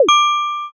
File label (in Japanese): shishiodoshi